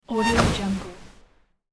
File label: transition whoosh wasp sudden reverb; transitions and movement